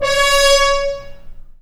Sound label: BRS F.HRN, FHns Mutes; Roland LCDP06 Brass Sections; F.Horns FX+